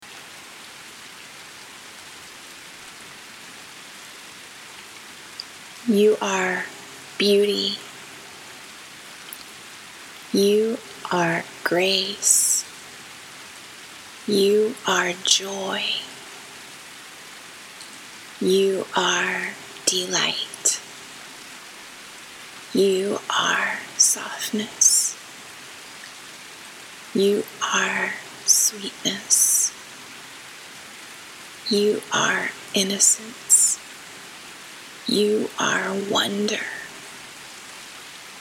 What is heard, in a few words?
Rain